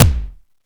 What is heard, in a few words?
bass, AKAI XE-8; Greytsounds